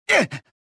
choke; reborn

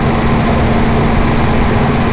forklift

